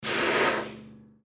airpump

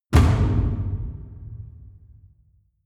drum hit hollow small

